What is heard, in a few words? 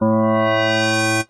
opener, Zaps and Beeps